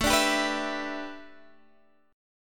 A 7th Suspended 2nd Suspended 4th Guitar Open D

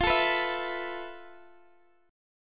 E Suspended 4th F; strummed; Esus4/F

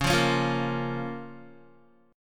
Db 6th, Guitar; strummed; tuning=4E,4A,5D,5G,5C,6F

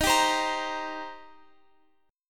Eb 6th Eb down Guitar Open F; strummed

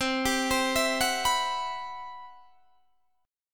C-Major Sharp 11th, Guitar DADGAD

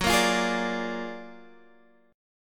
Gb Augmented Major 9th Guitar DADGAD

